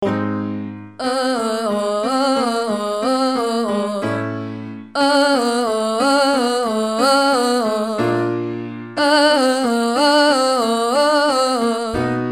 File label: Exercise Trill Down